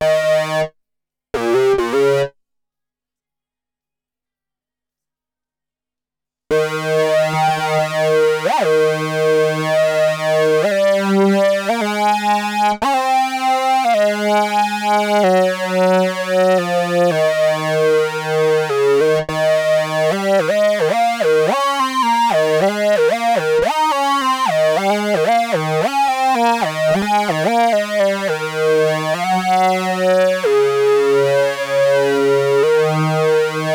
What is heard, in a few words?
MOOG